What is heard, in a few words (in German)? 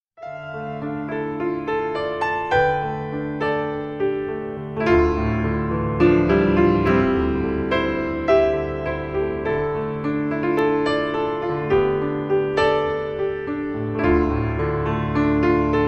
VaterUnser Instrumental